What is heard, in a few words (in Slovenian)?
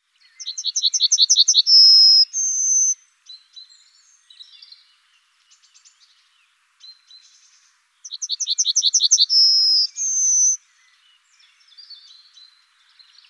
rumeni strnad Emberiza citrinella; TomiTrilar